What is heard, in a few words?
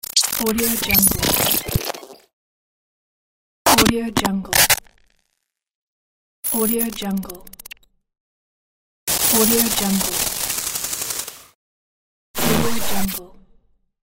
glitch noises; glitch noises pack; sonicside